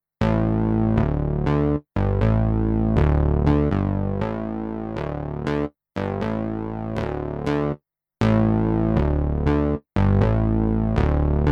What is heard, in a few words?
Phoenix Audio Ascent One DI Keys HPF Vari Filter